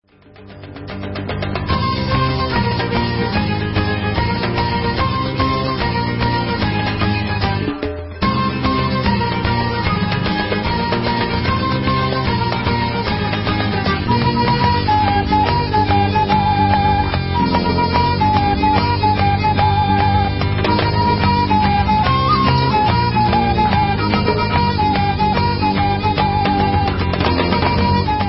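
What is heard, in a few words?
Instrumental, Israeli Dances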